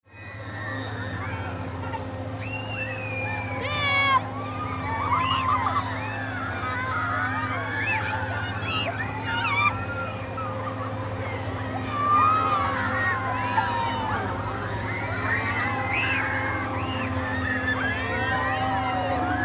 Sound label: Carnival Kids Screams; Amusement Park; Ambience; Amusement Parks; Blastwave